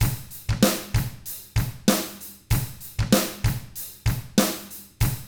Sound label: HARD ROCK, Drums; ILIO; Double Platinum Drums 1